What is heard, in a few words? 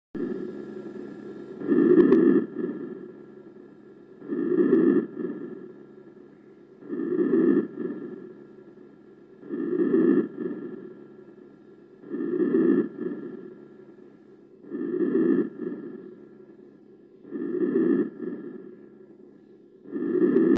Vesicular breathing; simulatorvzorky